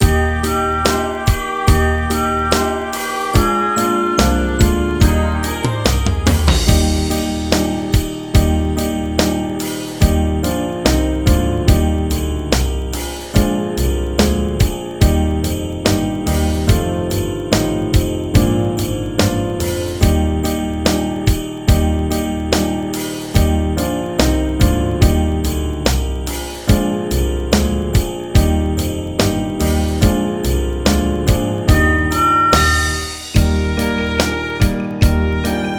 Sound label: Jingle Bells Playback